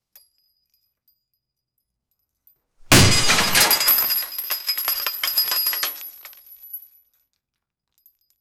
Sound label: medieval knight jump in, armor